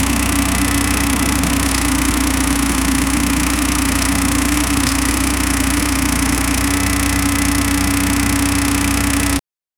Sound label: steady vespa scooter engine